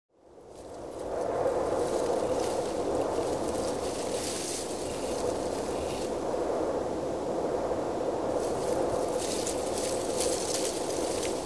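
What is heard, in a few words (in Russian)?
гудит ветер и уносит листья; Звук ветра в лесу; звук ветра в листве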